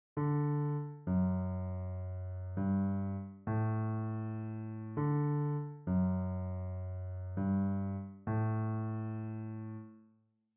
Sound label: bassline